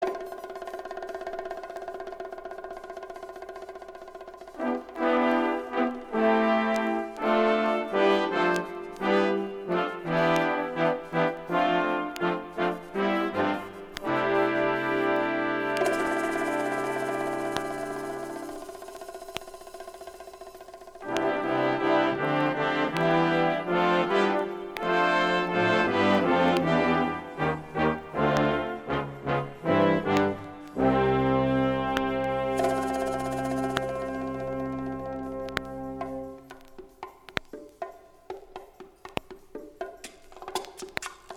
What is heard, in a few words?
BRASS